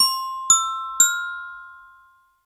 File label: Gliding Hawk